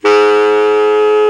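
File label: MULTIPHONIC, TENOR SAX; Northstar - New Gold VOL-1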